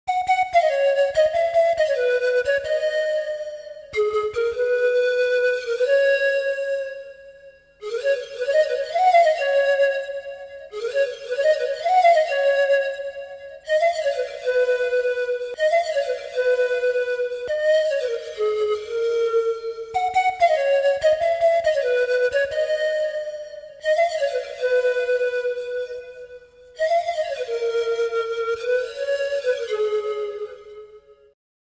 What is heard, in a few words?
Pan Flute